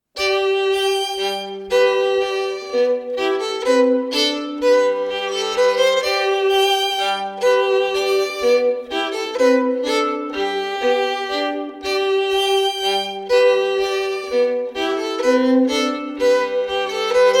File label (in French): telemann menuet, duo violons; telemann twv 32 13